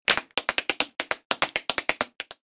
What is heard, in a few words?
clickety